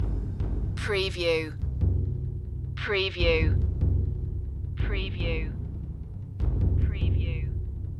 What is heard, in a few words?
taiko triads loop